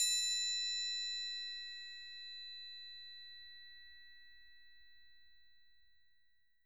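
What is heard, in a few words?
triangle open, percussion; Roland MV8800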